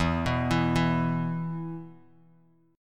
E 5th, Bass Standard; arpeggiated; JGuitar

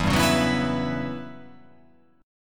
E Minor 6th Add 9th chord

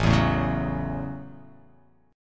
A 6th, guitar

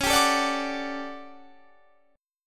A 13th C sharp, guitar chord

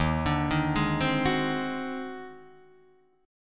D sharp Minor Major 13th, Guitar, DADGAD

